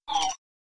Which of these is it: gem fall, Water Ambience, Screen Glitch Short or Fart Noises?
gem fall